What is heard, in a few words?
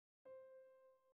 Dynamics pppp